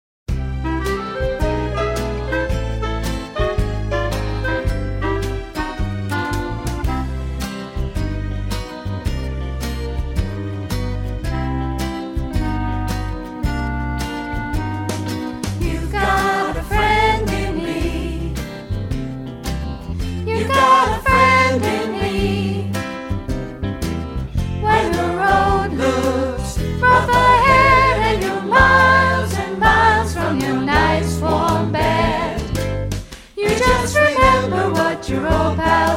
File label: alto